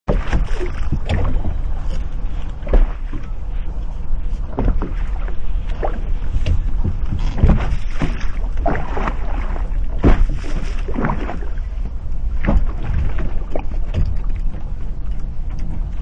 Rowboat, Sounds of oars creaking and water splashing as rower rows a boat.; Boats (Sound Effects:Vehicles)